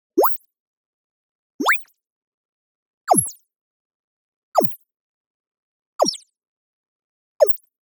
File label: tonal, friendly filter blob; boom library; Anime Essentials